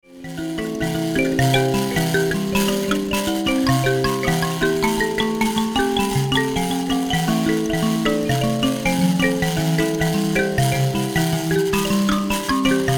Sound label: mbira